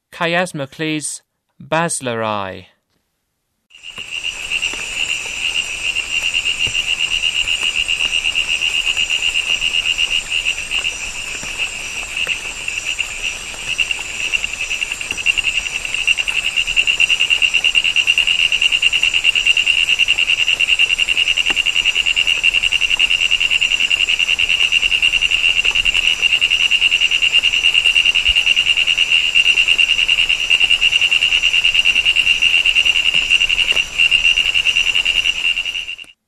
Chiasmocleis bassleri, Frogs of Ecuadorian Amazon; S. America

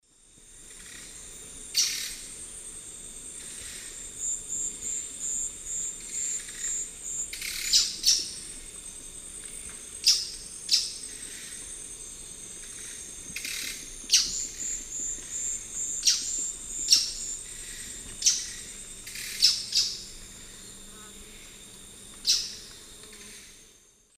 Philydor erythrocercum, call; S. America; Eastern Lowlands

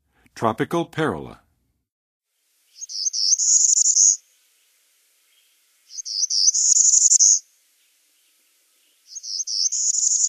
Tropical Parula, Songs of Birds of North America; Western USA; Western USA Songbirds

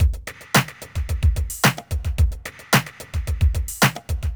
r n b kit 110

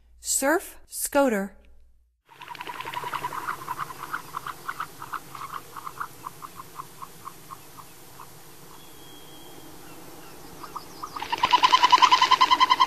Surf Scoter; North America; Stokes

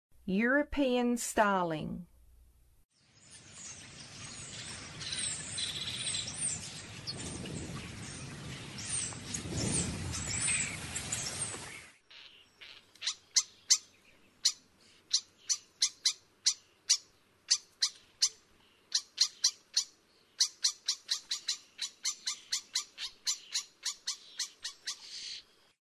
European Starling, Western USA Songbirds; North America

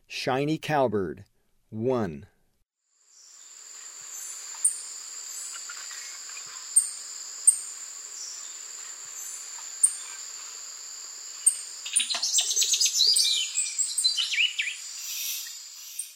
Shiny Cowbird; S. America; Eastern Lowlands

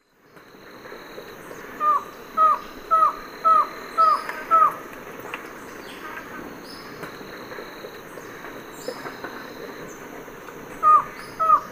Thamnophilus aethiops, song; S. America; Eastern Lowlands

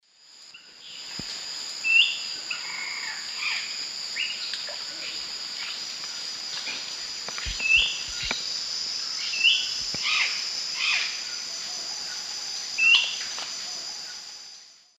Zimmerius gracilipes, call; S. America; Eastern Lowlands

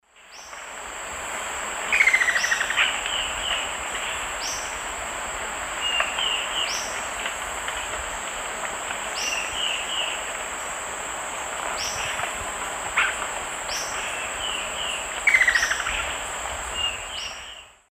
Leptopogon amaurocephalus, song; S. America; Eastern Lowlands

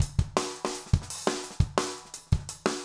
jungle 170

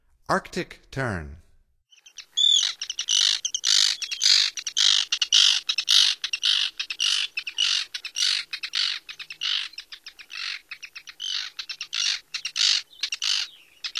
Arctic Tern; North America; Stokes

